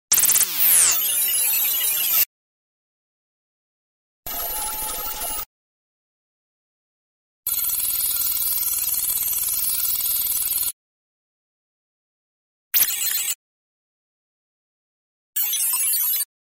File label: Galery Noise; Sound Ideas - Galery Noise 002